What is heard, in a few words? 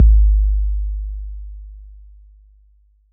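bass drum 808 Decay E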